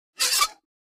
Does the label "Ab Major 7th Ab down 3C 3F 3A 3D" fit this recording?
No